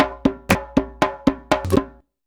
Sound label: 119 DJEMBE, Modern Percussion Loops